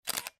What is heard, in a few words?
Screenshot; China Mobile; A3s